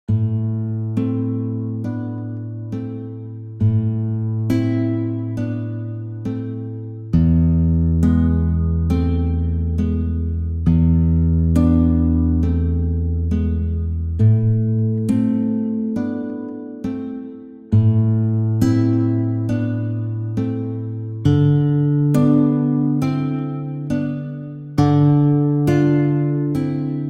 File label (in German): Fluss im Regen 68bpm